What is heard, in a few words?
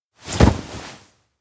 futon jump up; anemoi